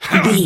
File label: voice male attack